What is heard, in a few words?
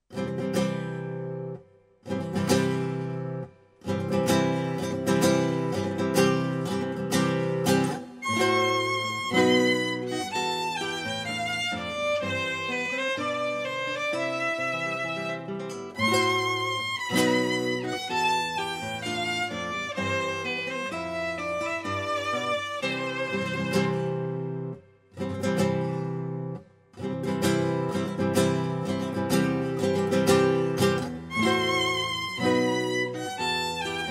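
Wedding March Duet Guitar Violin; Mendelssohn, F. - WeddingMarch (Gtr/Vln Duet)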